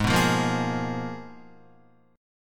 Ab Minor 6th Add 9th, Ab, Guitar; strummed; JGuitar

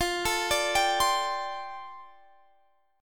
F 6th Add 9th, Guitar Open D

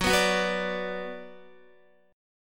Gb Suspended 4th Sharp 5th Gb, down, Guitar; strummed; JGuitar

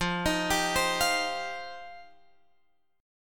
F 7th Suspended 2nd F down Guitar Drop D

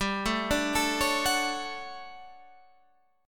D-Major 7th G; DM7/G arpeggiated

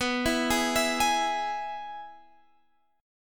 Minor Sharp 5th; Gsharp; B; 19,19,18,21,21,x

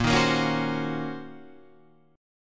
Eb Minor 13th Bb

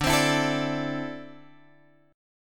D 7th Suspended 2nd down Guitar Open F; D7sus2 strummed

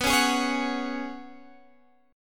Gb Suspended 2nd Suspended 4th B

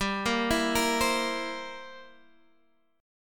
C 7th G

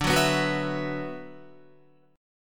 D 6th Add 9th D, Guitar; D6add9 strummed; JGuitar

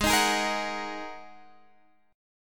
G sharp 7th Suspended 2nd, Guitar, Half Step Down

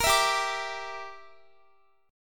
G Minor 6th, Guitar; Gm6 strummed; x,x,20,21,19,21

